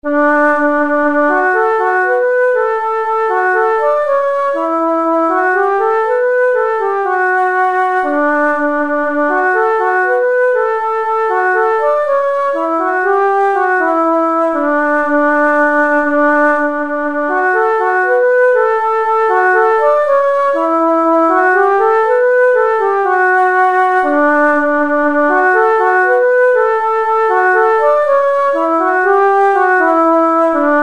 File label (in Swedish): Bro schottis, nyckelharpa